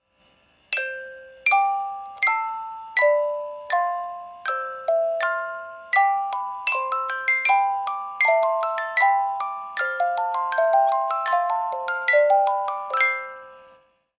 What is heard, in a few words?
classique canon